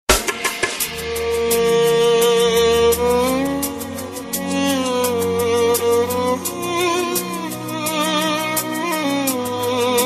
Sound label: Romantic Ringtone